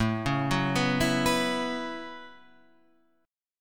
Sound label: B 7th Suspended 2nd Suspended 4th, chord